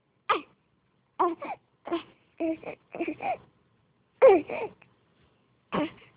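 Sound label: baby hungry, female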